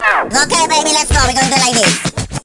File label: Battle Mode Stage Start